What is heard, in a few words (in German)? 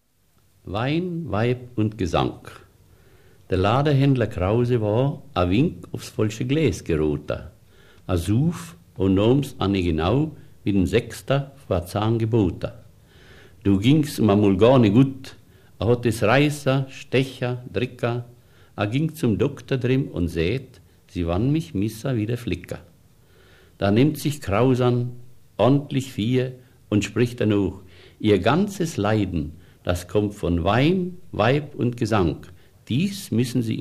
weinweibund gesang